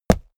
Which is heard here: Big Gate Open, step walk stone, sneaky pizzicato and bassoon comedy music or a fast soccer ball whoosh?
step walk stone